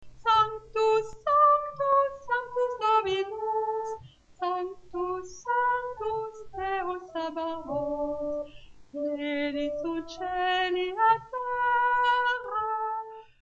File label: Soprano, Chorale; Pro Europa Sanctus